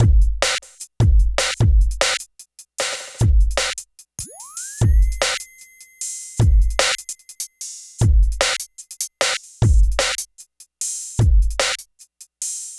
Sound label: V Drum Loop; Sonic Foundry (Sony Creative Software); Electronic Point Blank; Drums & Percussion